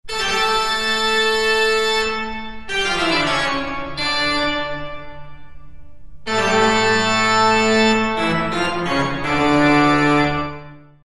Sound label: bach toccata and fugue in d minor; 1707; scary